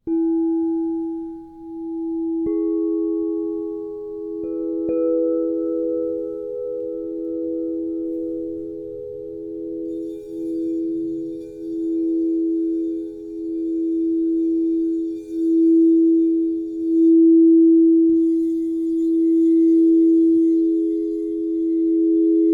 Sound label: set, B E G Crystal Tones Harmonic Singing Bowl Set